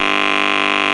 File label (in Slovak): Elektronicky budik; Revelation Sight and Sound SK